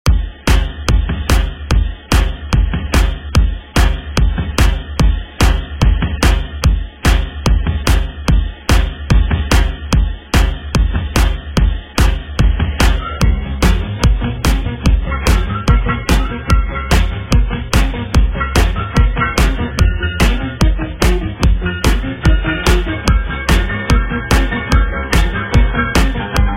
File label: 50s Redrum 146 Bpm